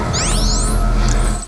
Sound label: wiz